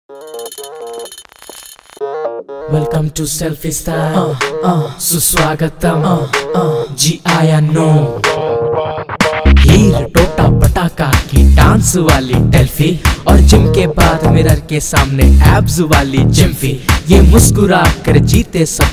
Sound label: Acoustic